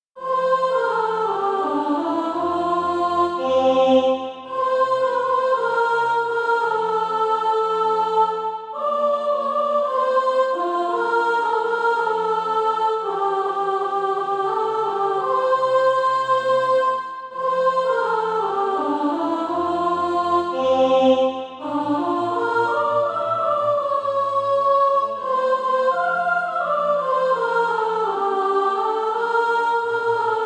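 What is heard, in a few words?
soprano, SATB Practise Music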